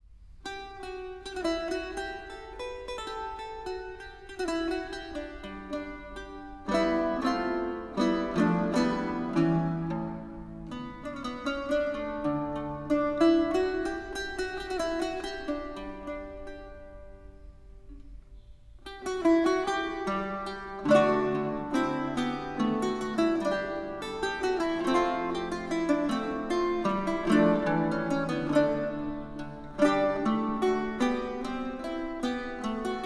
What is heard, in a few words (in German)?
plektrumlaute; musikinstrumentenmuseum; ich fare do hyn; wolfenbuettelter lautentab